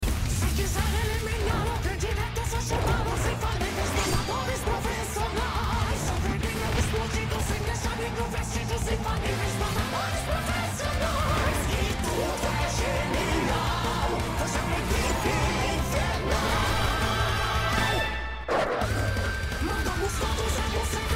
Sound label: Jingle (Rock Version); I.M.P